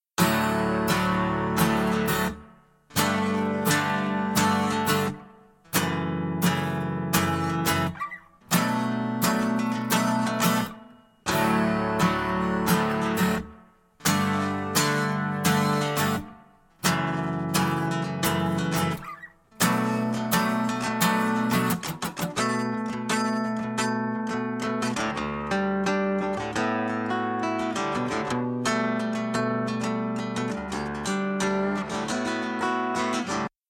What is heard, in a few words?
Loop Guitar Acoustic